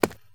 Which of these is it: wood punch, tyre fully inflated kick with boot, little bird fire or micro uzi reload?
wood punch